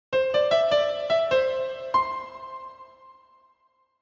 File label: fdn, Piano, reverb